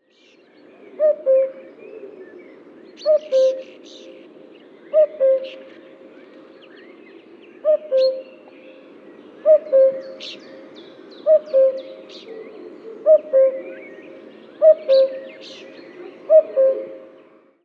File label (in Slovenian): kukavica Cuculus canorus; TomiTrilar